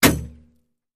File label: Bullet Impacts Metal